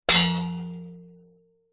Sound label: warfare sword strike metal could be shield vibration; zapsplat